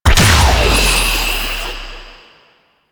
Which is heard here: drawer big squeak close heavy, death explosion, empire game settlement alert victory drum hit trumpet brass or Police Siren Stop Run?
death explosion